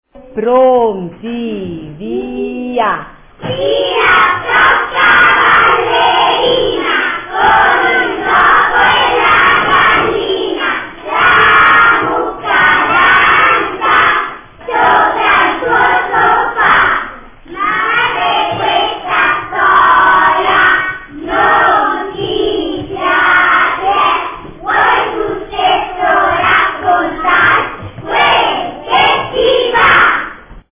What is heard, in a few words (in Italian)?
Filastrocca ballerina